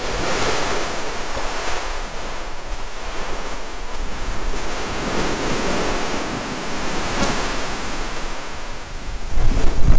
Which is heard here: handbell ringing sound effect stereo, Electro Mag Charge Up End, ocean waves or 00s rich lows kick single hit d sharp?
ocean waves